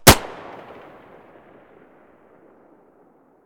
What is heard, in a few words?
glock17 muzzle mono distant